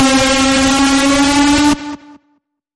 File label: Bend; Feel The Bend